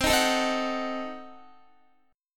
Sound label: C Diminished 7th, Guitar, chord; strummed; Cdim7; x,15,13,14,15,13; 4E,4A,5D,5G,5C,6F